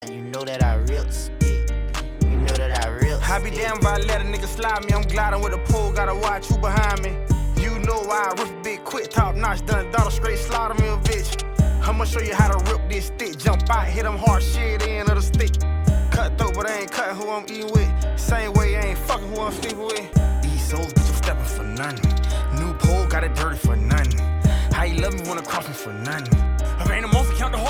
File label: ringtone